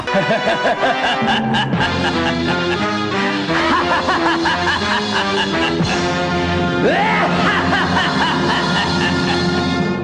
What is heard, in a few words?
Piccolo Laugh